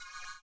CYM, Ethno Techno; ILIO - Ethno Techno

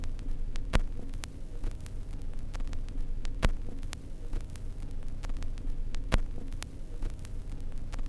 Crackle Loop, Vinyl Noise

